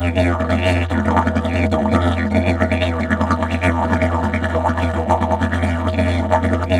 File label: F BURBLE, Didgeridoo; Big Fish Audio - Didgeridoo and Other Primitive Instruments; BURBLDRONE